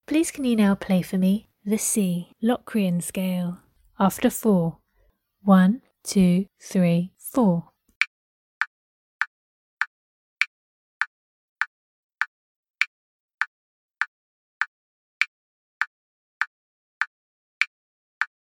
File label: c locrian scale